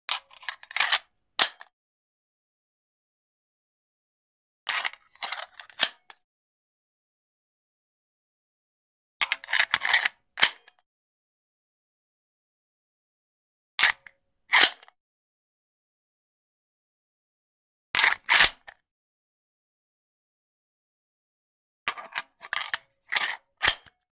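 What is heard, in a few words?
inserting a clip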